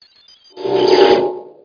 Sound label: alien drone call; hero; Kingdom Rush Frontiers